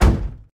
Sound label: car hit; CrossyRoad